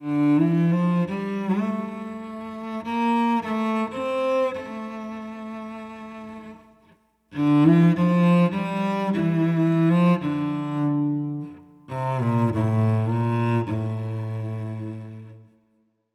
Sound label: Cello 105 Dm Wet; FYS World Cello